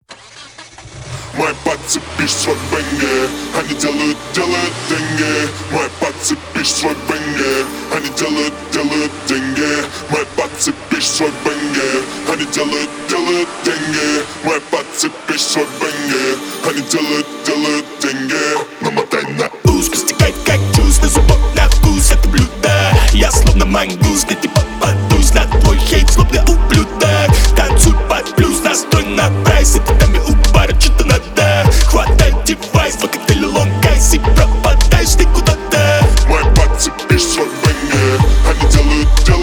Bassboosted

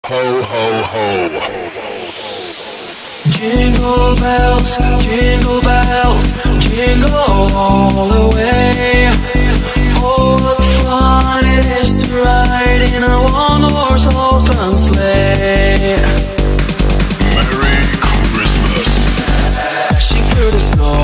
Jingle Bells Remix

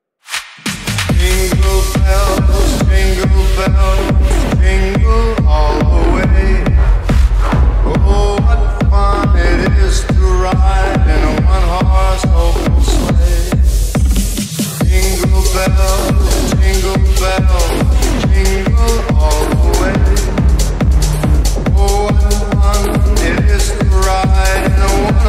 Jingle Bells Techno Remix

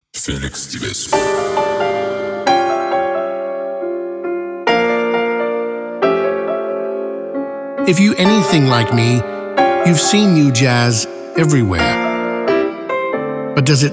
Jazz Intro

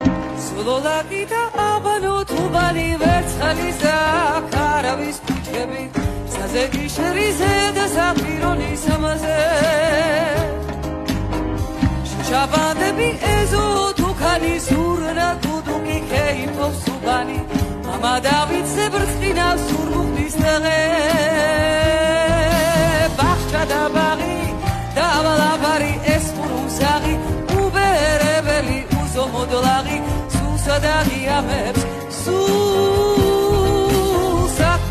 Georgian song; Shalaho

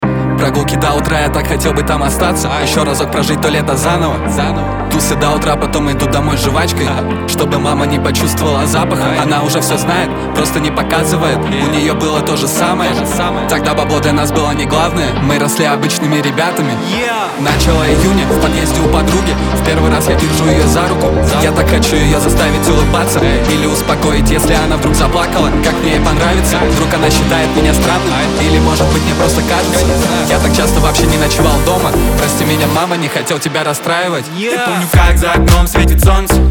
Remix

